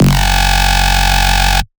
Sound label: Bass Shot F#, dubstep; One-Shots; Papercut; Ghosthack Advent Calendar 2021 - Day 16 - Dubstep Basses